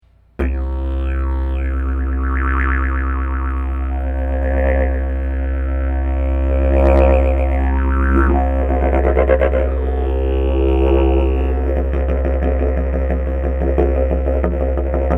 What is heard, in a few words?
didge